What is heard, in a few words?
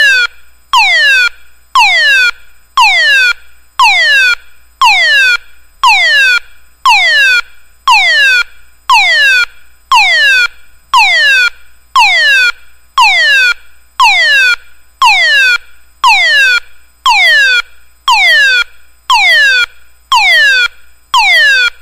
special warning